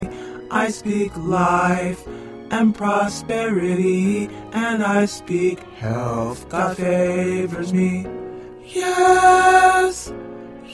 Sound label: Tenor